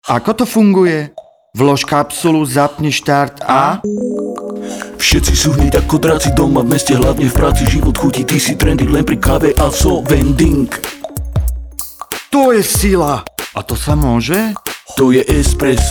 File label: Rap Lavazza Espresso